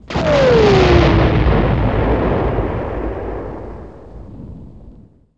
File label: explode; xwing